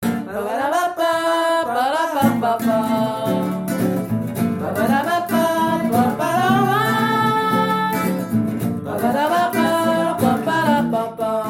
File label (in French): thème 2 sur basse 1; Courcelles Sur Aujon